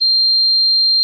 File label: Final Beep Tone; Escape From Tarkov; BackdoorBandit